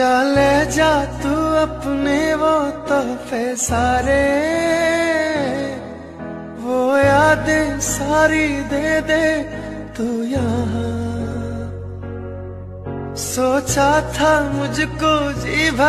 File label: Ringtone